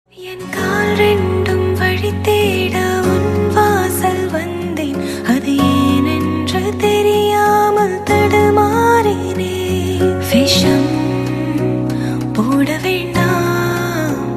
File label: melody; tamil; kavalai vendam